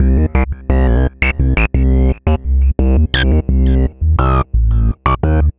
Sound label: Synth Bass